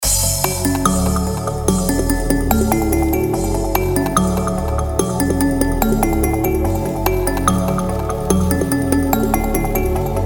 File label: Melodic Trap Chill